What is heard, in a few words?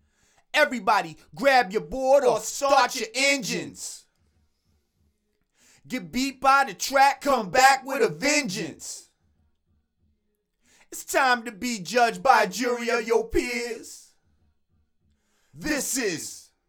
Chorus; Summer X Games